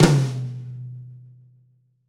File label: Pop Flam Tom, Roland BK-5; Toms